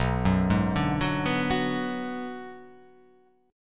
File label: B Minor 6th B